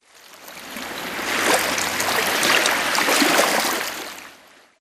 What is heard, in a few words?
swampland water lapping; Titanfall 2